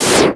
ROCK

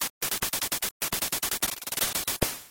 Battle Win; Mega Bomberman; Genesis

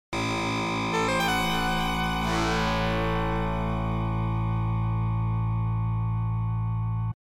Tinkle Of Rings; Genesis; Jewel Master

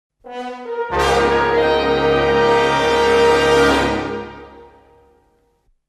Dramatic Impact, Production Music; Ren & Stimpy - Production Music

